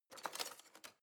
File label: knife wood drawer handle slide